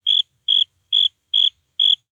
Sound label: Amb Animals; Red Orchestra Ostfront 41-45